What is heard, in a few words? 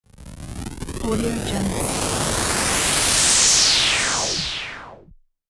8 bit transitions